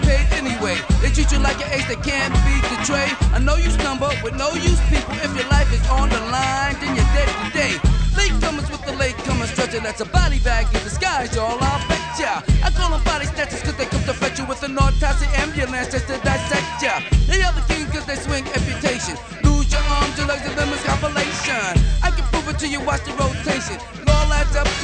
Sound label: hiphop